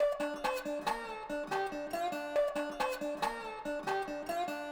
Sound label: GTR Resonator